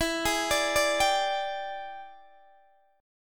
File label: E 7th Sharp 9th Guitar Open D, chord